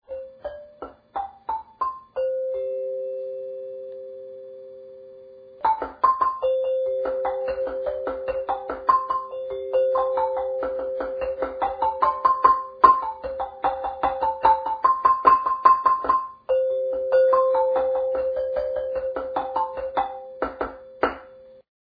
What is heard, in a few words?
Multidinda Custom